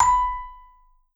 B4, Vibes; Sampleheads; Dave Samuels; Marimba & Vibes

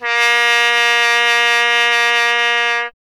Trumpet A#0; Miroslav Vitous - Solo Instruments VOL-1

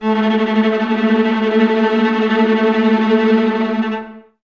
VLS A Tremolo, Violas, String Ensembles; Miroslav Vitous

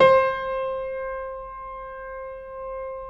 Keyboards Acoustic, C4; USB Soundscan vol.17; AKAI

